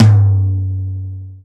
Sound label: XV Tom Lo, Roland XV-5080